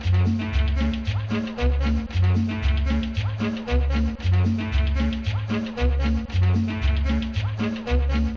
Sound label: boom arm cumbia loop